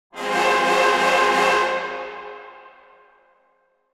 Cartoon accent

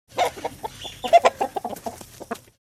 Chickens clucking chicken coop; animal

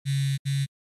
Phone vibrating message notification

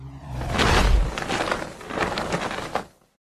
Caped superhero zoom past whoosh fast

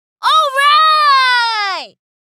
Little girl excited vocalization

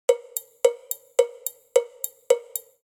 Cartoon walk percussion

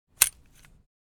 Grass shears garden single snap

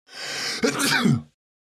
Male breathes in and sneezes

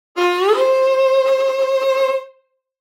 Violin slide up

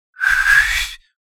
Human failed whistle

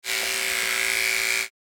Electric razor beard trimmer

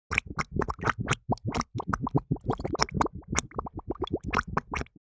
Cartoon drowning bubbles

